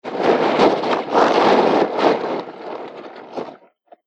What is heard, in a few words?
Horror Movement Crumbling Crypt Sounds; Scary Soundscape; Andrew Fleming